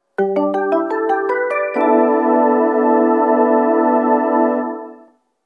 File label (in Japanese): 発車メロディー, 中央線 駅放送; 発車メロディー(2番線)